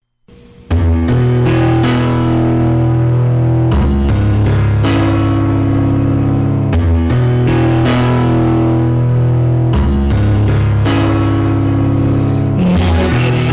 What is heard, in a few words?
piano song; Piano song recorded in the 1980s; voice sampling